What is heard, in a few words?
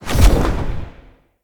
Staff Caster Cast Heavy Shot; Weapons; Player; Staves; Galene